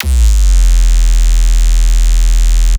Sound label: bass banjaxed; Polyend; Recue